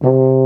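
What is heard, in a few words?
baritone C2 horn; InVision Interactive; Lightware VOL-1; Instruments & Percussions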